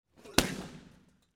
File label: boxing single punch